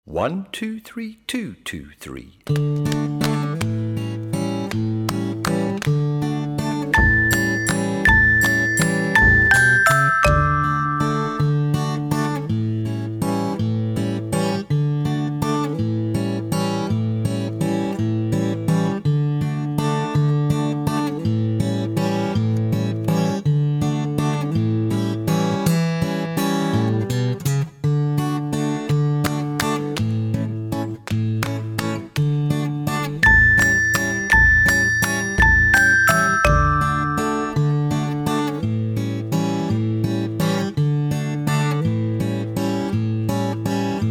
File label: music backing